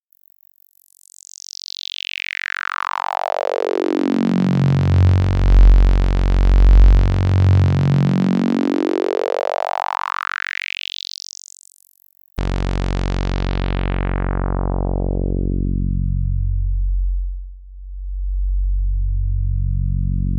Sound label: Anti Filter